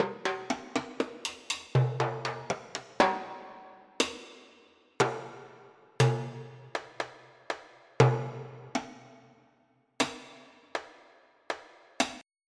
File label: Hi Timbale